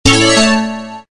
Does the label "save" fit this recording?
Yes